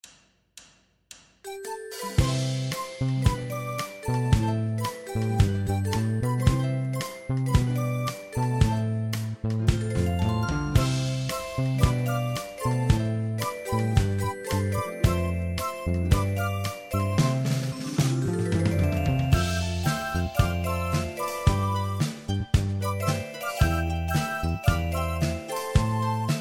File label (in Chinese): 背景音